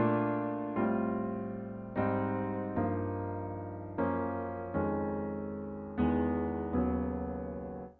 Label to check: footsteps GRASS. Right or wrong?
Wrong